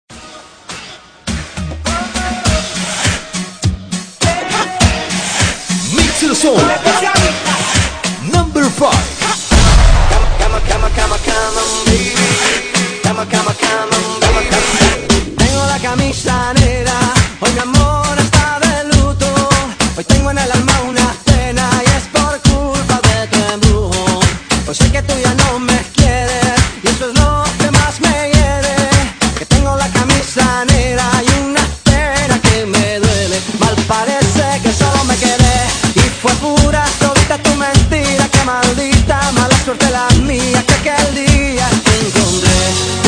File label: remix